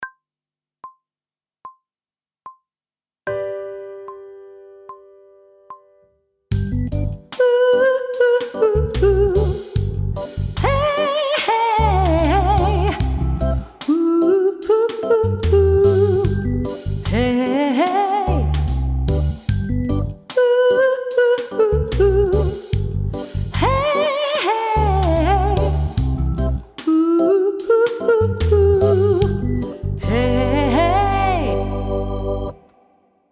Technical Work Riffs G major (with vocal), pop vocals; grade 3